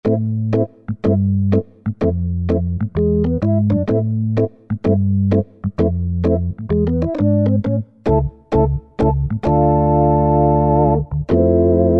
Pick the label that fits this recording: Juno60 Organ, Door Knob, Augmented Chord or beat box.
Juno60 Organ